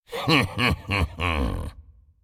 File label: laugh; Ryze